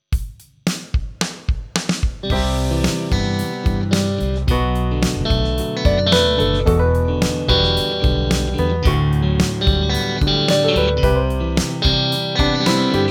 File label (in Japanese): エンハンサー, BOSS GX-1